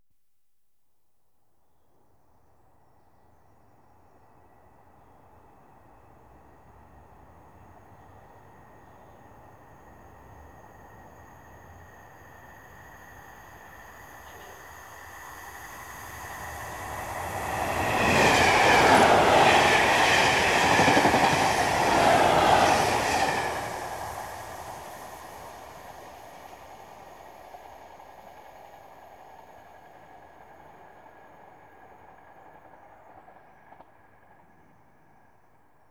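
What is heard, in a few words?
Trains Passing Commuter electric; BBC Sound Effects Library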